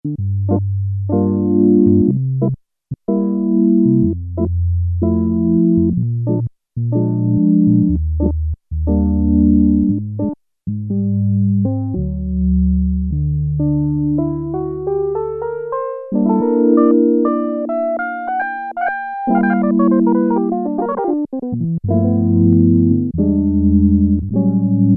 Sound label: Percussive Electric Piano, Prophet-5; Sequential Circuits Prophet-5 (Ver.3); Factory Patch